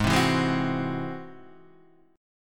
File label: Ab Augmented 7th Ab Guitar Standard; strummed; 4,3,2,1,1,2; Ab+7; JGuitar